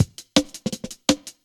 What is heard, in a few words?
Skippy Cut, Drum Loops, Jungle; Zero-G Jungle Warfare 1, 2 & 3